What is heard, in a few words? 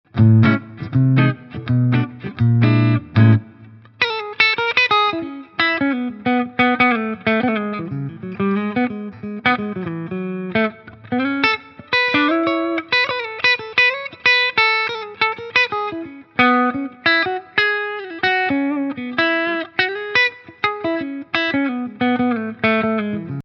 Fender Squier Paranormal Toronado Halspickup clean